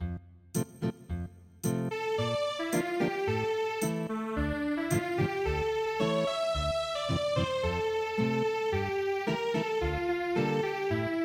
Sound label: foreign guiter; Ack Varmelnd Du Skona